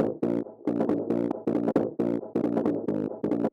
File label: Intro Bass